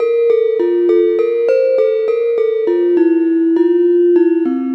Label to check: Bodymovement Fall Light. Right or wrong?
Wrong